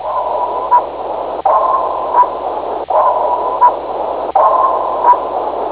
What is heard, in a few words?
ship sonar pings four